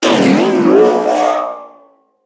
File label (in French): Cri Méga